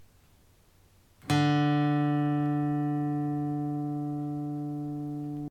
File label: 4th String D